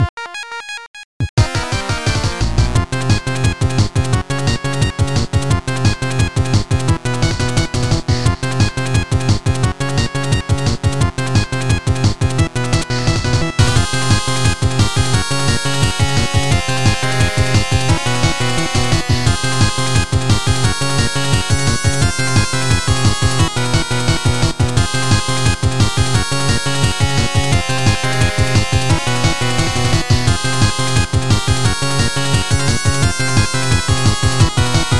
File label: Gravity (Internal Percussion)